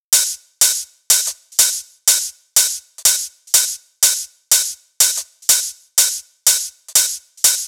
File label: Hat loop, EDM 123 bpm; Future House; Drop; Ableton Projects; Big EDM 123 bpm (Drop) Project